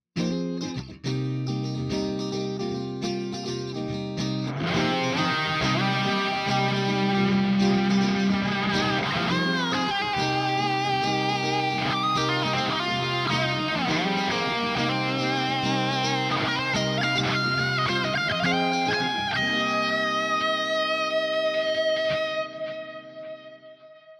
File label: Electric Guitar; Scarlett 4i4; focusrite